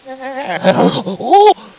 alert; svencoop; aslave

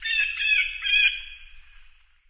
random jungle; svencoop; underrain

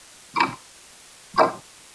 clock; svencoop

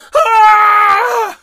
scream; svencoop; st allies; darkwing

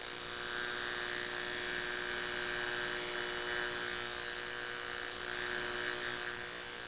buzzer neon; svencoop; sence; mistake coop

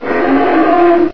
attack, baby panther; svencoop; custom monsters

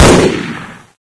m4a1 fire, weapons; svencoop; st mission

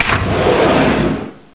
door move; svencoop; sence; mistake coop

